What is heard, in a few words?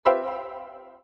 Rainbow Cake